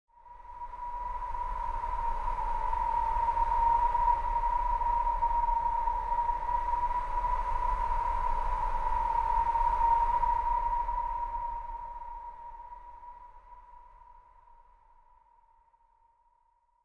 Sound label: Suspense airy whoosh